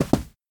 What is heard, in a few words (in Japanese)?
landing